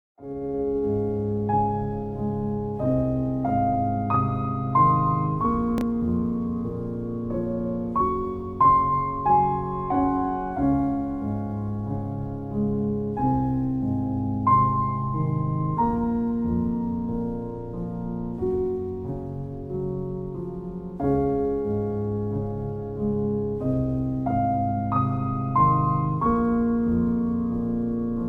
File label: Piano Relaxation